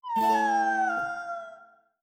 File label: windows balloon